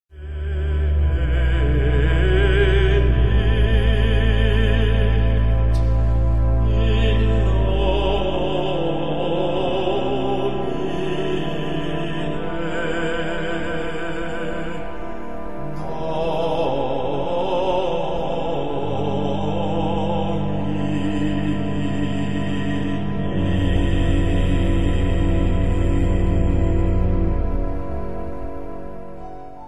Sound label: Missa for baritone baritone chorus orchestra organ; Durufle; Cum Jubilo; Op. 11